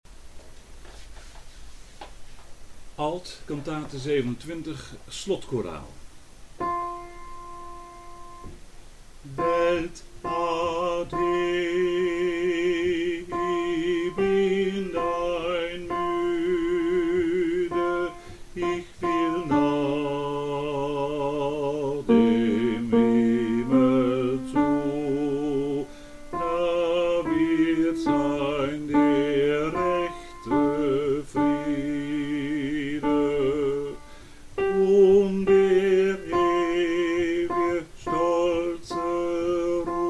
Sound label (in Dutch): Alt Slotkoraal; BWV 027